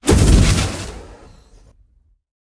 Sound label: idle flamespew; smite; god merlin